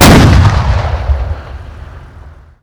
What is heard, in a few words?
Vehicle Weapons; Red Orchestra Ostfront 41-45